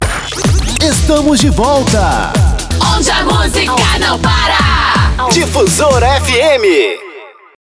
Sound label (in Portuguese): VOLTA BREAK; PACOTE ENTRADA E SAIDA BREAK